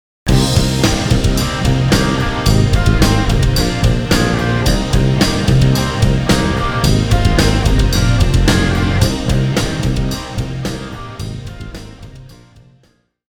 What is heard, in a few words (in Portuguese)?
Riff com excesso de graves